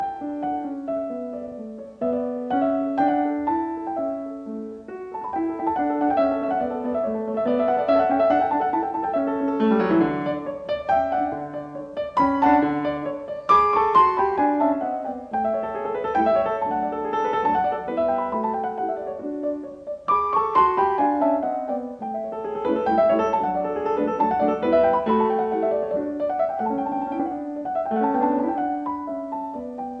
Piano Sonata No 5 in G major K 283 I Allegro; Lili Kraus

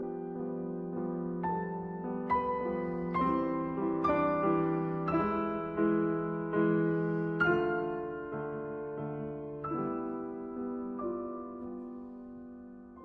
Piano Sonata in G minor Andantino Getragen; Op 22; Juana Zayas

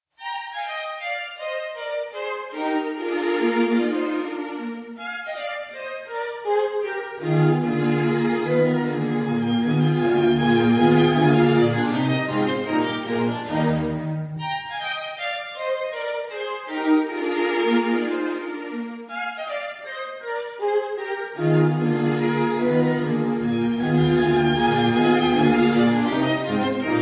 Viola Quintet in E flat, Menuetto Allegretto

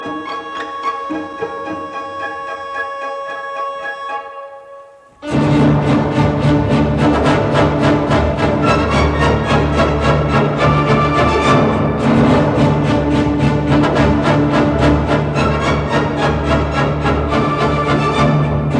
Symphony in D minor, Scherzo Bewegt; Symphony No 9; WAB 109; original 1894 version ed A Orel; lebhaft

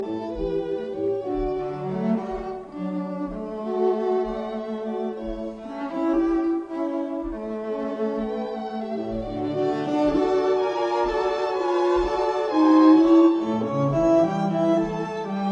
version for string quartet Allegretto con grazia; Danzas de Panama

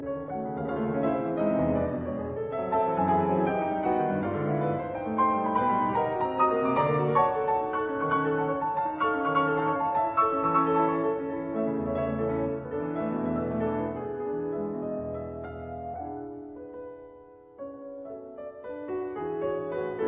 Piano Sonata in F-sharp minor, Presto con fuoco; Op 23

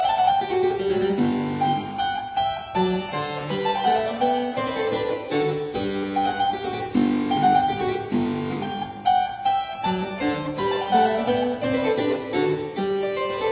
Keyboard Sonata; in C major K 515

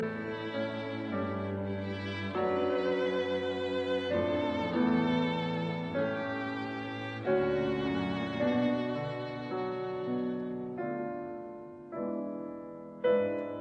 Piano Trio in A major Lento; Op posth; Mirecourt Trio

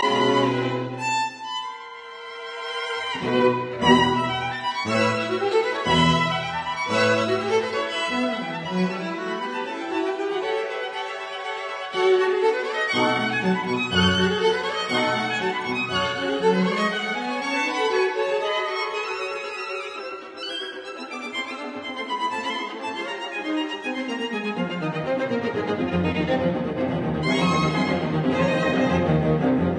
String Quartet in E minor Allegro agitato; Bridge; Bologna

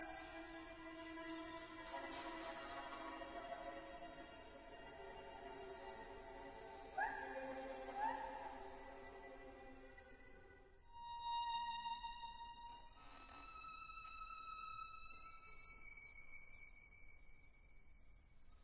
String Quartet

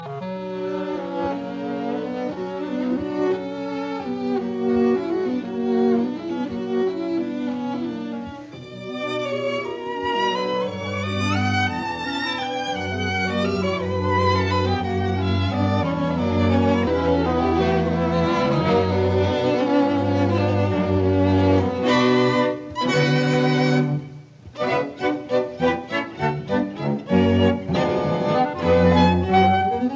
Clarinet Quintet in B minor Allegro; Brahms; Op 115

